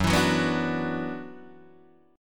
F sharp 7th Suspended 4th Sharp 5th F sharp down Guitar DADGAD